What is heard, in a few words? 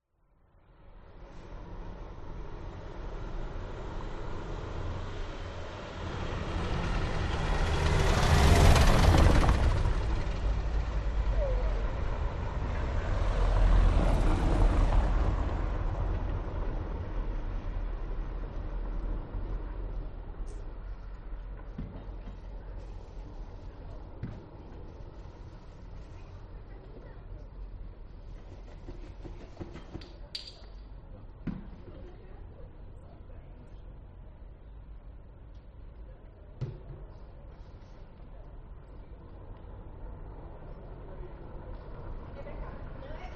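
public places city night very quiet few voices occasional car pass cobbled road lisbon; portugal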